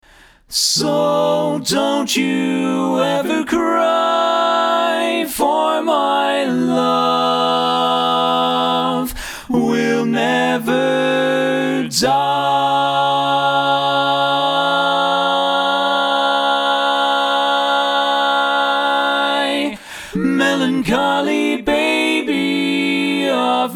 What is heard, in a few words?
Lead, barbershop